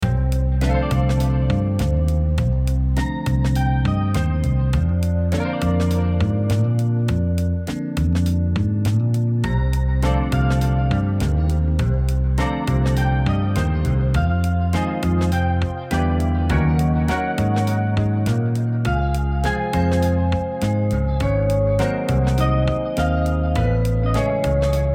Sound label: Record Store Music